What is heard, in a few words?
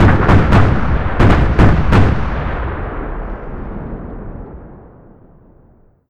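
cannon sequence heavy filtered, Explosions; Call of Duty Modern Warfare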